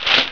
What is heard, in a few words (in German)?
ZEITUNG